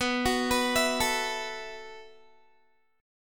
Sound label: B 7th Suspended 4th B, Guitar; B7sus4; arpeggiated